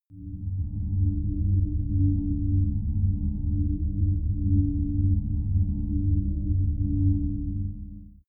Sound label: dark shuttle; raumschiff